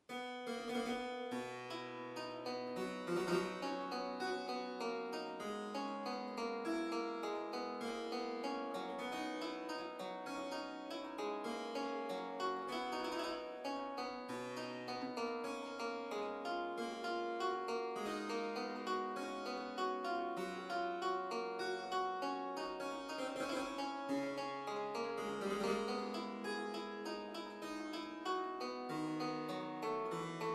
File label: harpsichord music; francois couperin